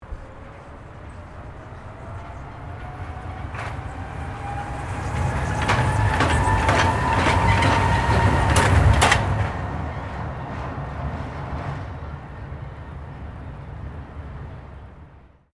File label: MONORAIL PASS; Sydney; Syney's Monorail system; Trains; Vehicles